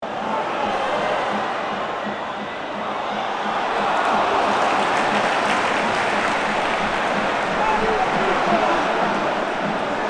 Stadium crowd cheering and chanting ambience

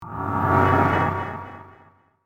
Sorcery spell curse mark

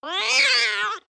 Angry cat meowing

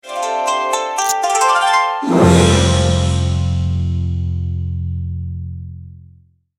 Asian slot machine casino win combination

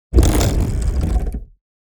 Vintage friction mechanical texture

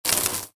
Electric static spark

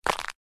Muffled bone break deep

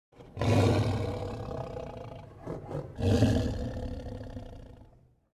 Lion growls while eating

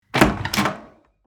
Hotel door open

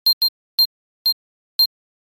Sci-fi laboratory door security keypad beeps

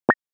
Bubble click

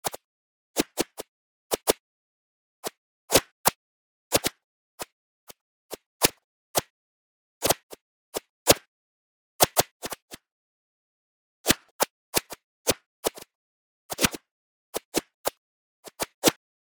Paintball field ambience flying paintballs

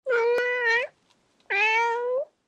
Soft cute cat meow meowing; animal

